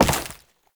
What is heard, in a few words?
Step Ice; Warframe; Oraxia; Foley